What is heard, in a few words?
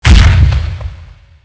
cannon fire; shipcharge; Pirates Of The Caribbean